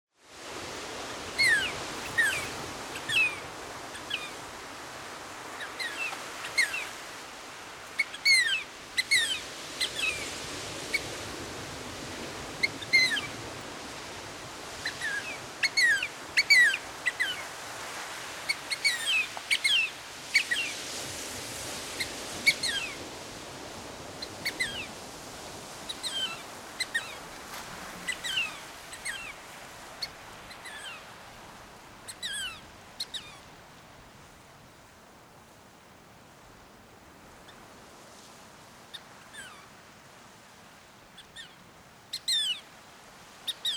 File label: Sooty oystercatcher